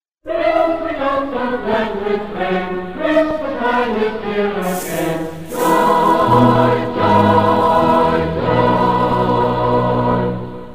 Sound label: Vocal - The Bells Refrain